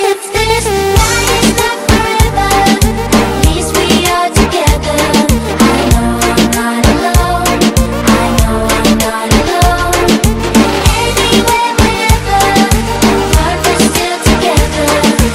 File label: Reggae MIX